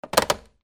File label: PHONES